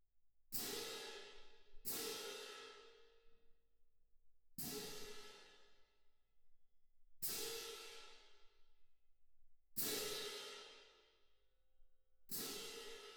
Perc

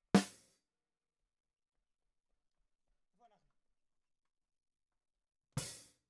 Snare

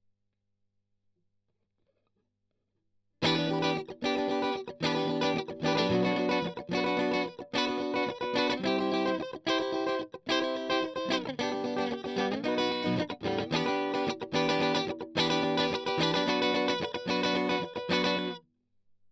Strum

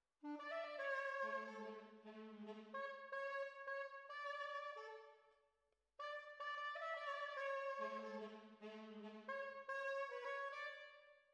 Trombones, jazz for piano

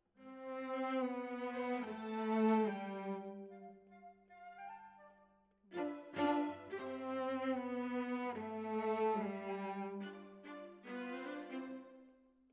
Cellos

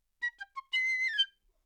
Flute

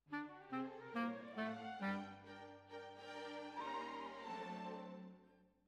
Bassoon, Jazz for piano

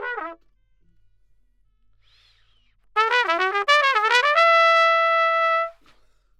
Trumpet

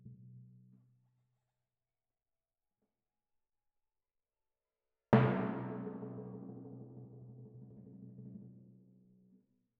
Twin F Timp

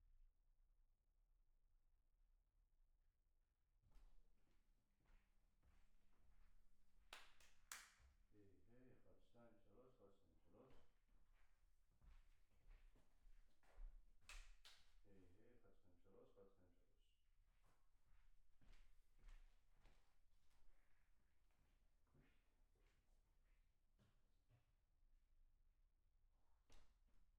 PERC OH

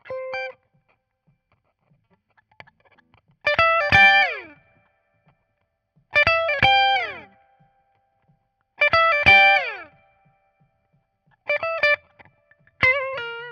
Guit Solo, RNR for piano

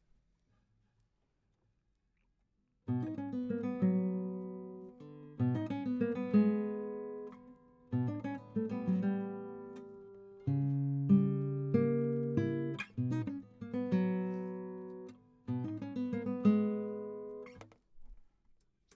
GTR

